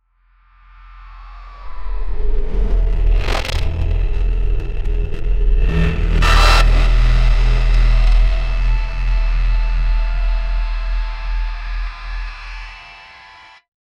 cinematic time stop deep, bass pulse

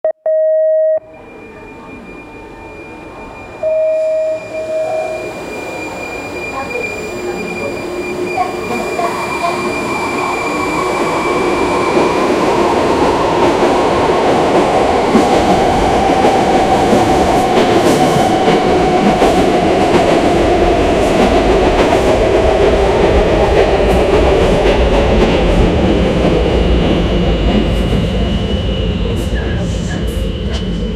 the sound of a subway, braking

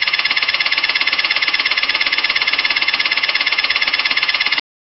slot machine win noise

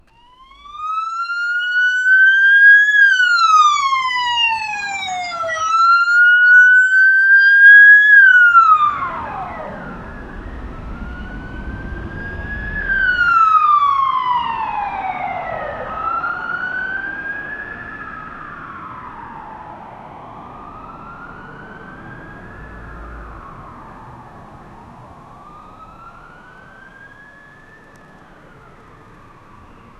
uk police siren far away; emergency services

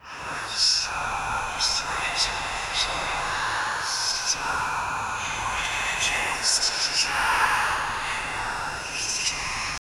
spooky whispers ghosts

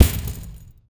chainmail armor hits a

